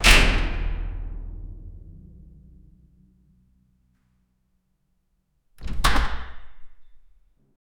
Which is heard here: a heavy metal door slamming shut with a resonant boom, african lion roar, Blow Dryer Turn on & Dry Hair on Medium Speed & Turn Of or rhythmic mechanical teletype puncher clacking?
a heavy metal door slamming shut with a resonant boom